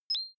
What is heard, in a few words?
notification message pipe high short; kommunikation; signale